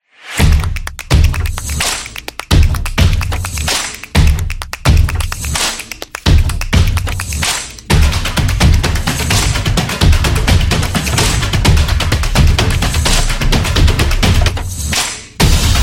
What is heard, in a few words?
Drum Action